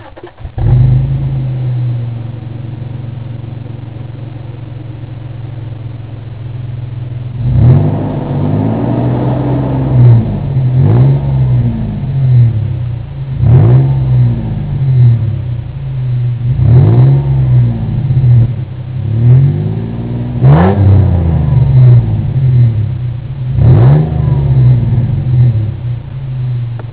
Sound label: dynomax, exhaust